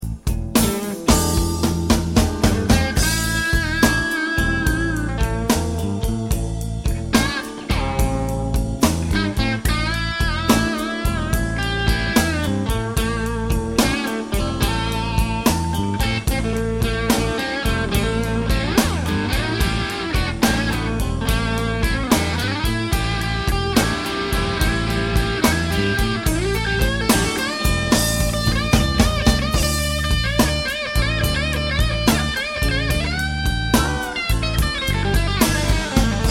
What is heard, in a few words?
blues